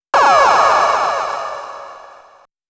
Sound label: Coin; Taito Digital Sound Archives Arcade Vol.2